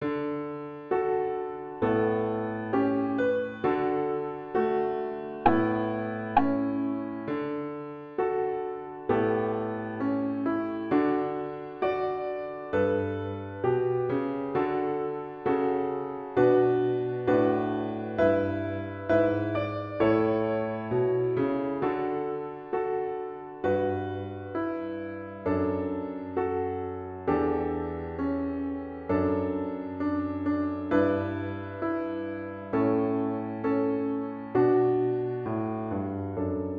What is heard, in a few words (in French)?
violon